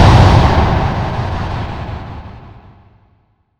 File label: explosion